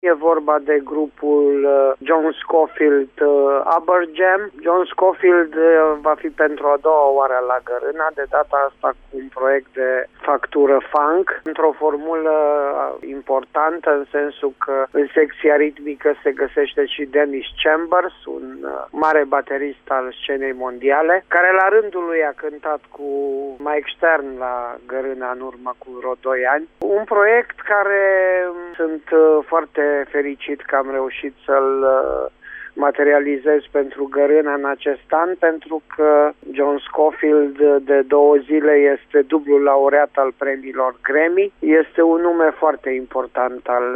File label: jazz; Garana